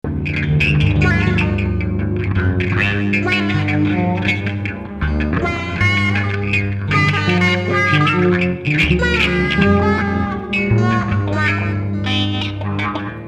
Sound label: zipper loop